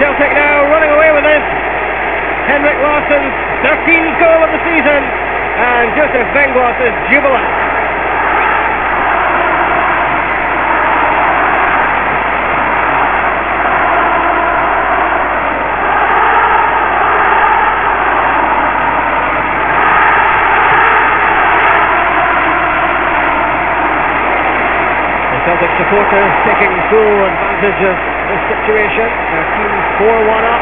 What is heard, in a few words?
haha